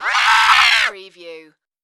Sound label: Jurassic Creature